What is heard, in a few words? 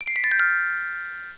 loading